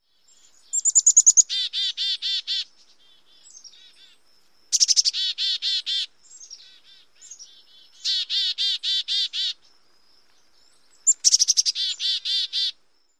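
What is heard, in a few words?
more calls, Black-capped Chickadee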